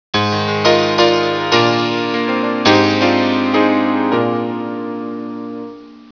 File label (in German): klav; winstrom